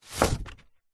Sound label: office file folder wallet card open